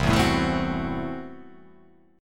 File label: C 6th Flat 5th C down